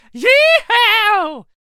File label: Lasso Attack Vocals